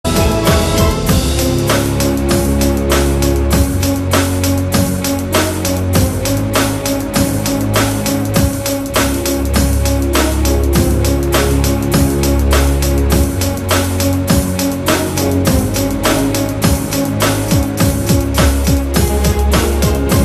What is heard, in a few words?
Closing Credits Bolero; Mouline Rouge